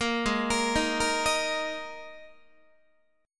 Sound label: G sharp Suspended 2nd Guitar chord, down; arpeggiated; 13,16,13,13,14,14; 4A,4E,5A,5D,5G#,6C#